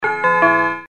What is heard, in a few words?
gong; praha zastavky